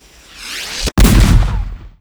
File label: aimed shot